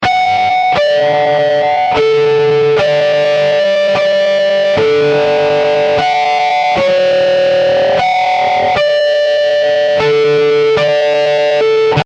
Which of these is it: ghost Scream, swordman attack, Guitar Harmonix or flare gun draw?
Guitar Harmonix